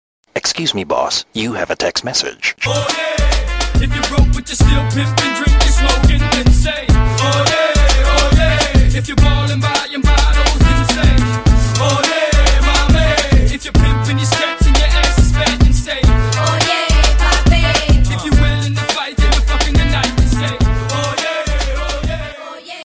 sms ringtone; fast and furious

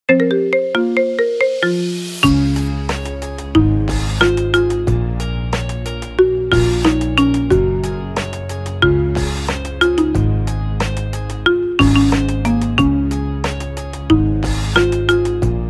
marimba remix ringtone

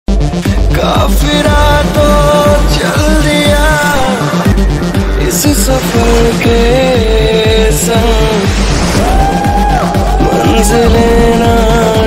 song ringtone, bollywood ringtones

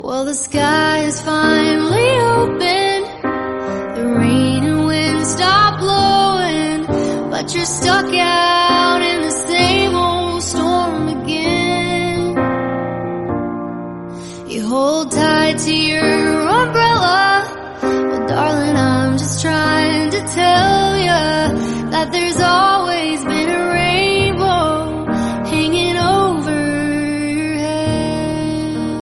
famous song tone ringtone

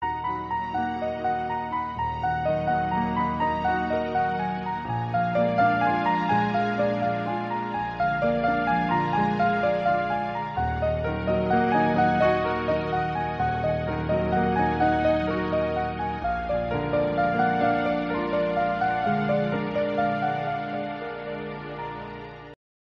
best light music phone tone ringtone

